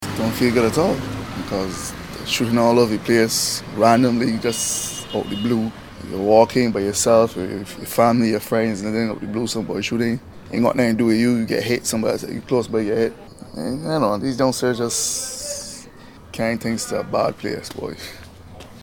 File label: gun